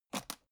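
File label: aluminium case small open short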